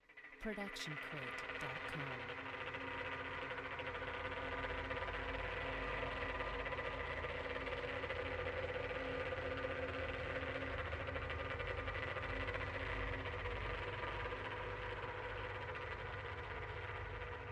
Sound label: Demon Creaking Build; SoundsCrate